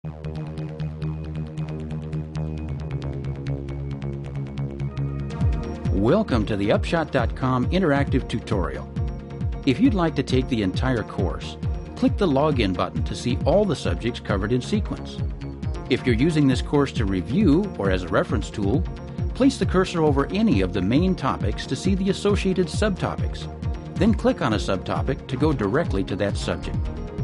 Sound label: Upbeat intro